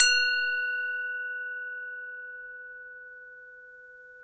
GLOCKE, GLOCKEN; SoundBytes - Early Patches

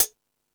High Hat, Drum Machines E-MU Pro-Cussion

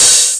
HH OPEN

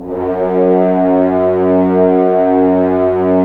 Horns; Roland - LA Composer vol. 4; HZ Horns

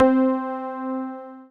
Dance Floor Bass, Multisample Basses

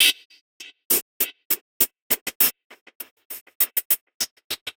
Side chain gated Topline (100BPM); musicradar

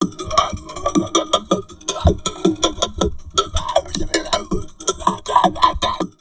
TALK 160, Drum Loops Crazy Processed

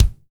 BD, DR BD; Best Service Gigapack 2

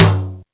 TOM H M1, DRUMS; East West; Global Sounds

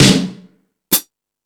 Sluggo Drums, Drum Machines

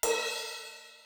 crash, Millenium MPS-400

